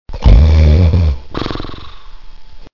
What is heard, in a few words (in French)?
ronflement